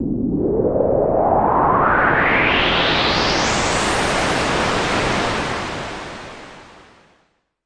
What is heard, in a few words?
noise, Noise Sweeps